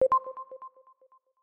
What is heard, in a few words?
Confirm Beeps